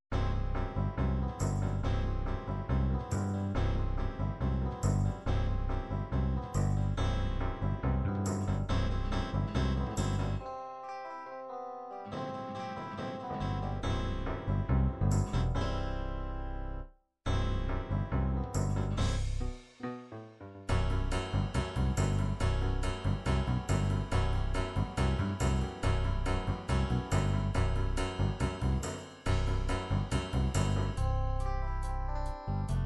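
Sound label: 8 beat lite, midi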